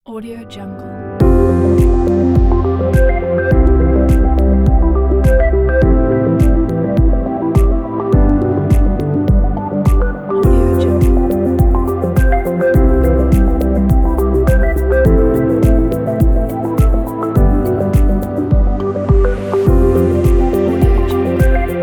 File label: minimal ambient background; warmwolf